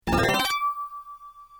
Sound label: coin entry; LeopardCats